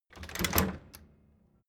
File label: door flap loft impact